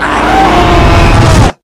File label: charge windup; tf